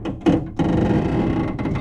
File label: car trap rope, ambient materials